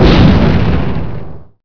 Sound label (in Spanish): explosion